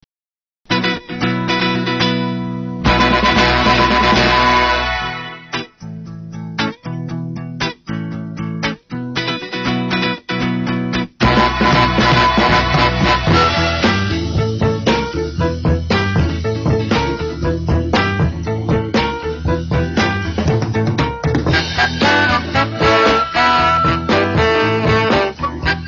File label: flute